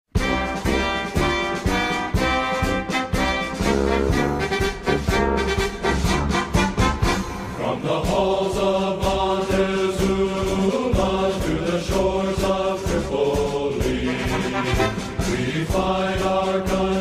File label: Hymn; The Marines Hymn; USMC Hymn